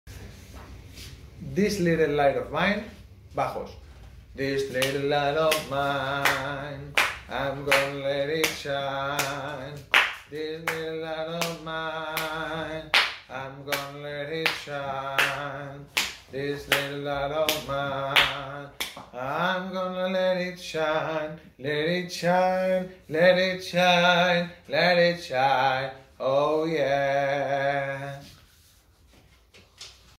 This Little light of mine bajos

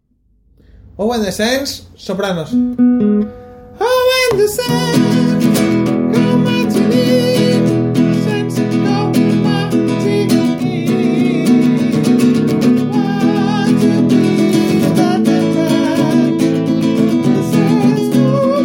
voz soprano; oh when the saints

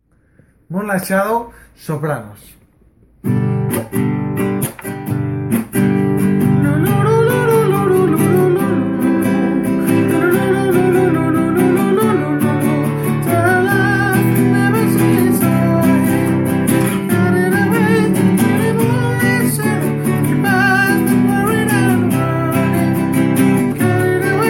voz soprano

